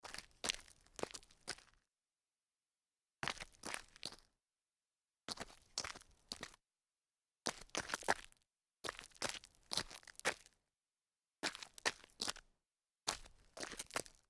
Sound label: sidestep on gravel